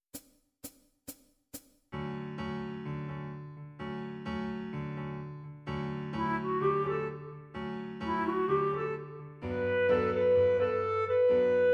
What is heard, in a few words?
bossa minus gtr